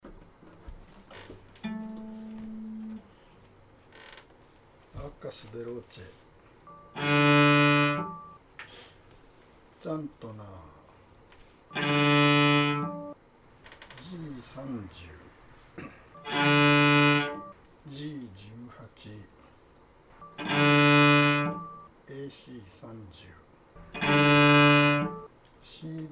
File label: bows staccato